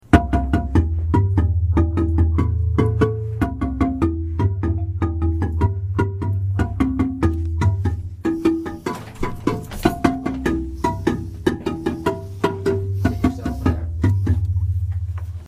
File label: bass, marimba; chikende